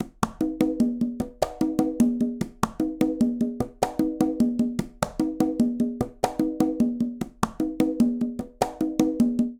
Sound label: Colombia Conga Tumba 100bpm; Latin Percussion; Ableton; Factory Packs